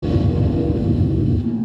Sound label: Alien amb